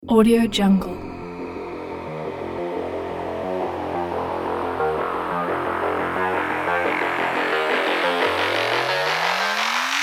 cyberpunk sport electro pack

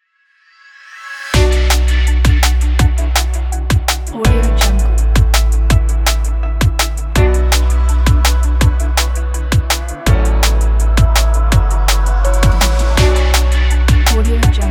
relaxing drum bass; audiosport

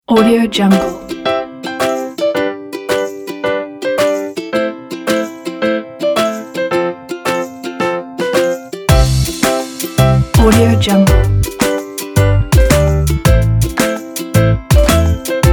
piano happy

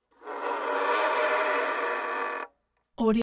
violin rasp muted strings

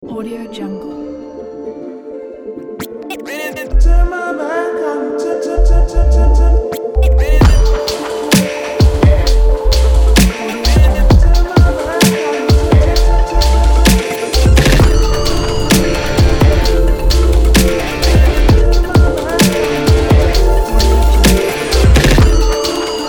hip hop lounge

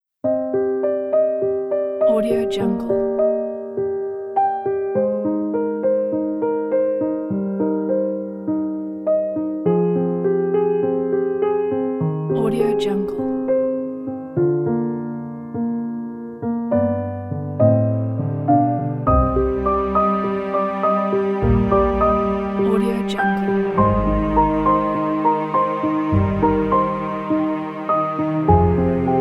romantic storytelling piano

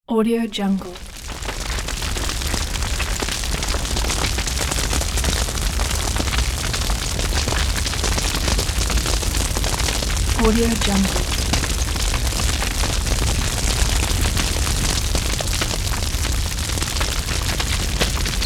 forest fire

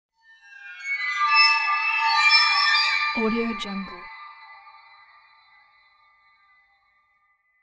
eerie horror bow screech

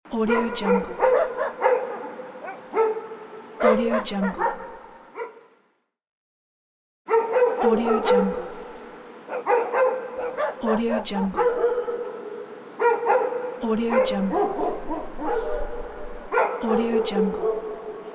street dogs

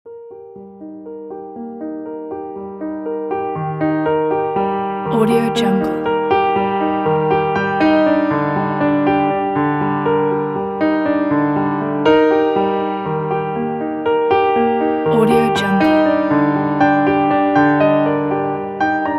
sad piano and strings; silversunmusic

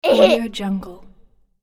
toddler little laugh

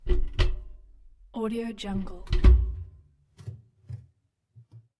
household washing machine door open; blazznet

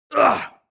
human vocal male fight intense

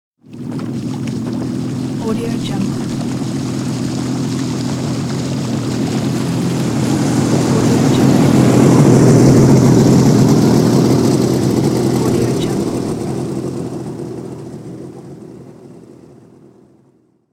motorboat pass by slow

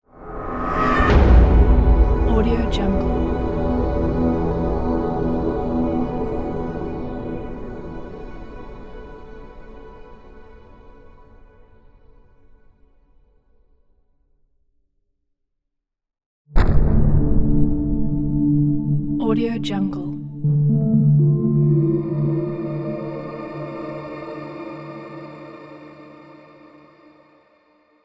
mystery accent pack

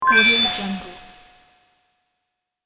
casual appearance effect

